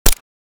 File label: Suppressed pistol single shot; guns